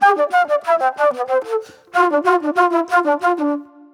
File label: Movie Flute